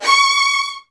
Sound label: VN C#3, Virtuoso Strings; ILIO; Kirk Hunter Virtuoso Strings VOL-1